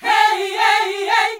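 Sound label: Gospel; Best Service Gigapack 1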